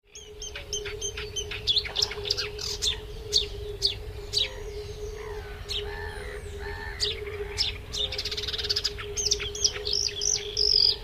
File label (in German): sumpfrohrsaenger